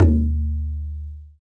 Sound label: Lo Geh Tabla; Percussion; Scratch